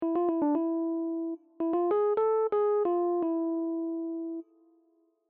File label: phryg dom melody